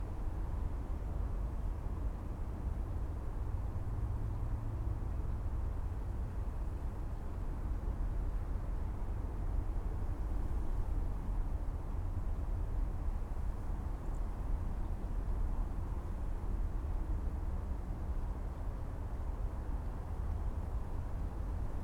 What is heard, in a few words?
Windy City Night Ambience